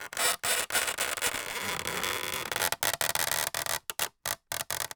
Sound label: Polystyrene Creak